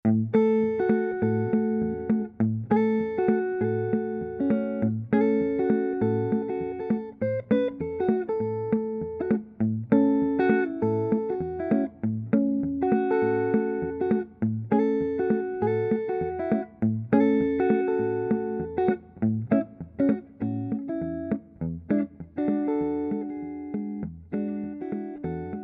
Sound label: solo